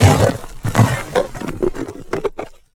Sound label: invader alien scifi texture; bluezone; sonniss gdc